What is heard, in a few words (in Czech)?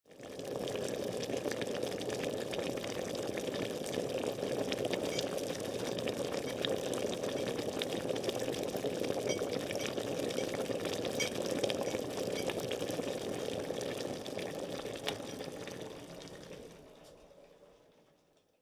bublina